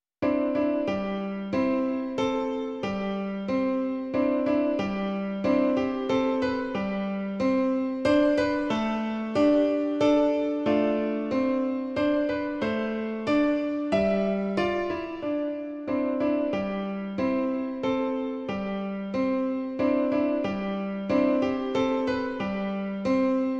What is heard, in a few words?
swing